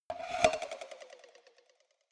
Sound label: cowbell scratch hit move muted